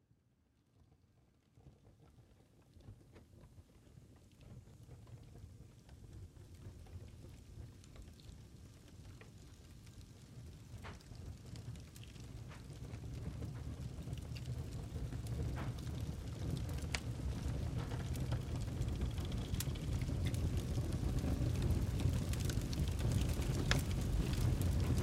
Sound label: Fire Start Build, Fire Start Building Small To Large Blaze; Flame Sound Effects